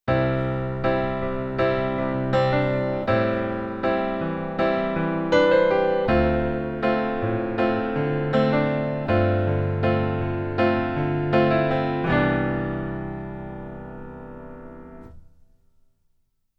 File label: Keyboard Pf Pops Pf